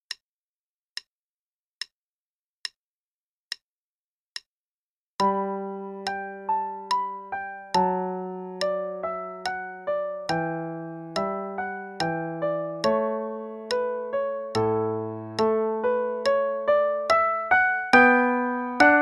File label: Minuet in G Major (2nd page only, qn=72); Bach; Bach Notebook